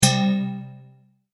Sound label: Multimedia Tone-Stop; Sound Design; McKinney Sound